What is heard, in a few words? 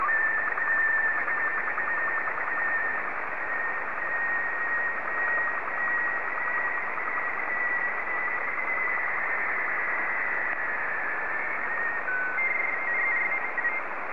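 Robot 12 black and white, slow-scan television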